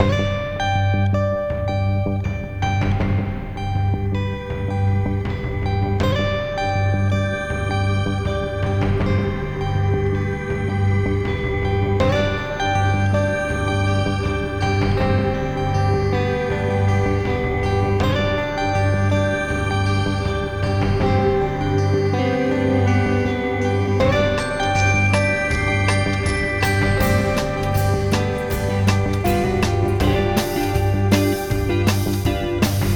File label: hip-hop